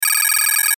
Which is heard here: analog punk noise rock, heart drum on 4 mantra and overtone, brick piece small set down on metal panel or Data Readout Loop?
Data Readout Loop